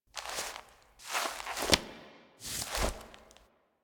Queens Chamber Foley Worm Queen Movement; Warframe; The War Within

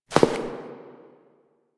Step; Warframe; Koumei

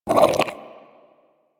Armadillo Vocal Tranq Dart Grunt; Characters; Warframe; Hunting Critters

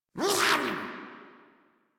Attack Effort; Grineer Ghoul Expired

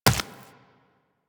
Run Flesh, Footsteps; Warframe; Tenno

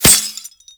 Helmet Impact; Warframe

